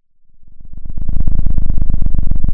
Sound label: pop, Sound of a cat purring